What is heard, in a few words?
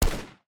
frnt assault rifle dist; Original TK Sounds